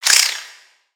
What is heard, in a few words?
Spider Alert; Lego; aronwk collection; ndaudio; creatures mechanical